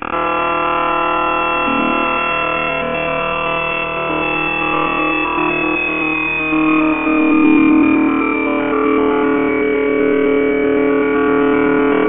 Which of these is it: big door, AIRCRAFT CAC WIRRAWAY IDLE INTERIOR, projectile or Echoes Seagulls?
big door